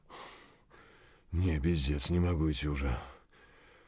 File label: tired, voice; Escape from Tarkov